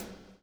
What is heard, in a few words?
BLUE, BLUES; Spectrasonics - Backbeat